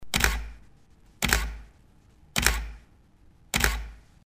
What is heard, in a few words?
normal, canon eos 5d mk ii